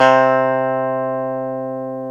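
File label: E 12 String guitar; Club-50 - Foundations Roland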